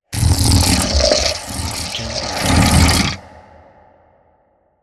Scary Roar

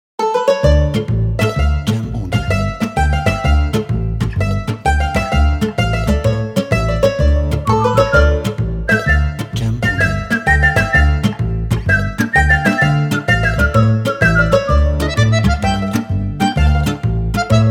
Italian Intro

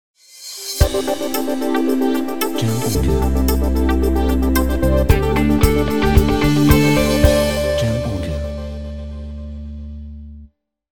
Corporate Tech Logo

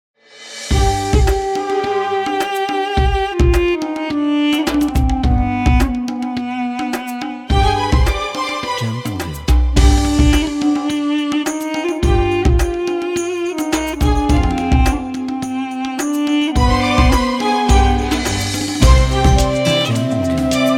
Arabian Night Middle East

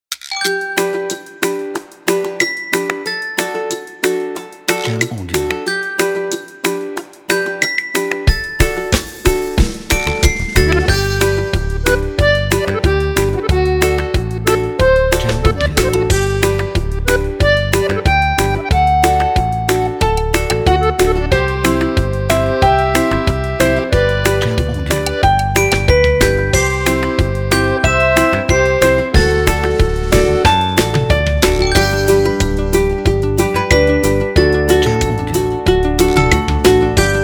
Kids Music Acoustic

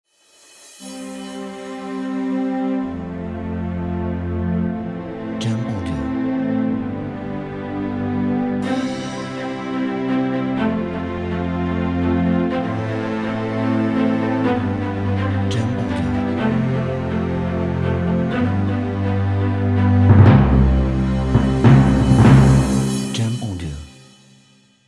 Epic Cinematic Intro

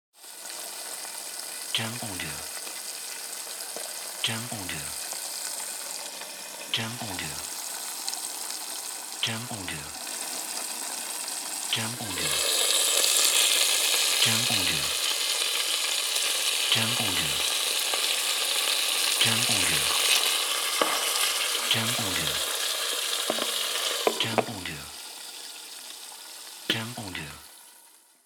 Frying Food

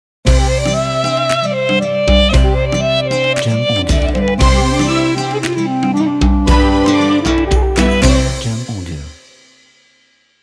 Ramadan Arabian Logo

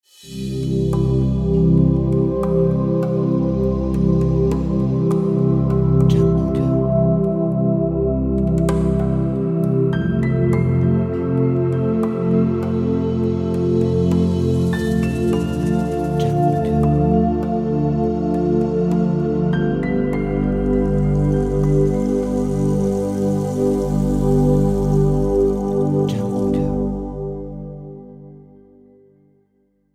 Ambient Inspiration Intro

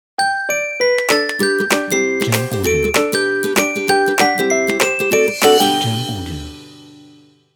Kids Happy Logo

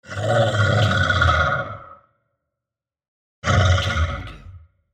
Monster Growl

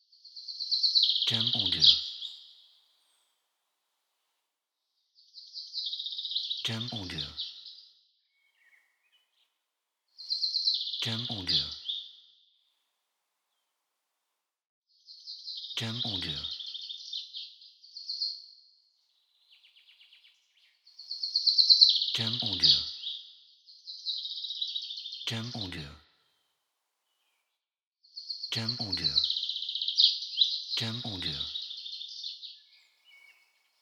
Birds in Forest

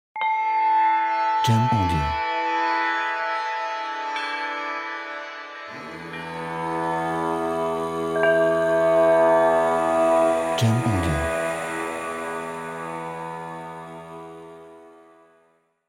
Sitar Healing

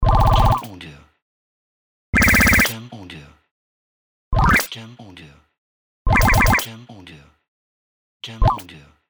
Game Glitches

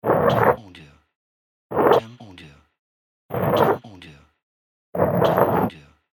Stone

